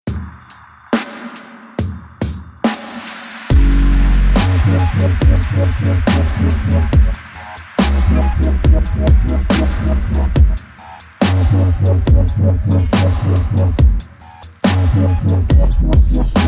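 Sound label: Piano Fantasy Short Rhythm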